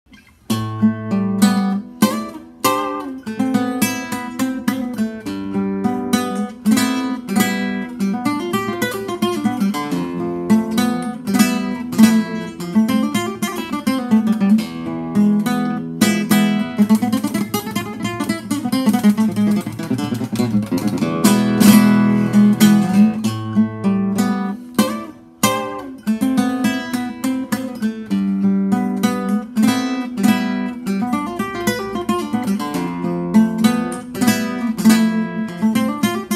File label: flamenco etude